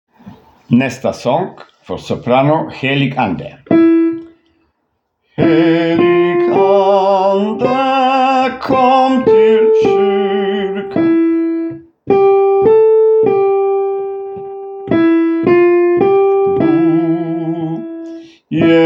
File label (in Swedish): sopran o alt